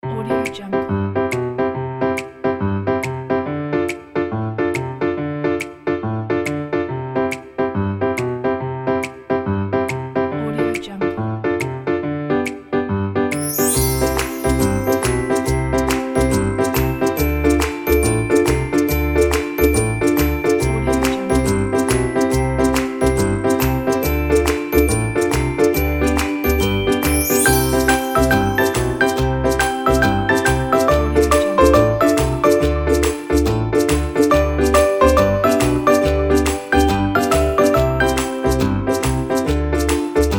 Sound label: funny playful kids